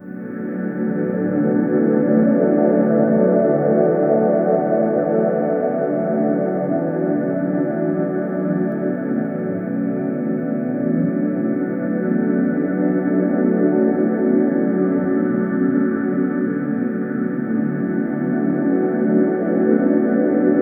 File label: ambient pad A minor; SFX Engine